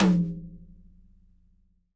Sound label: TD-20 Tom A, Roland TD-20 Toms